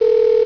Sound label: ring out